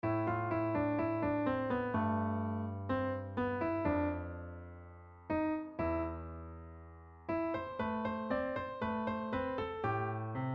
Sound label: game over; rpg用ゲーム音楽